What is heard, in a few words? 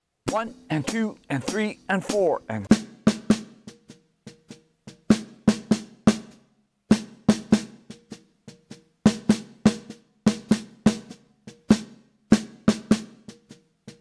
Melody Swung 8ths